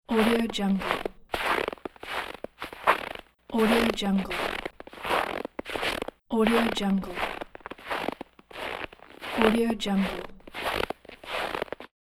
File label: foot steps in snow